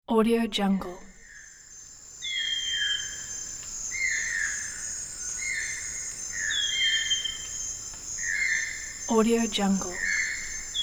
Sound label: forest morning